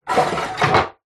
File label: household sliding wooden toilet roll holder slide down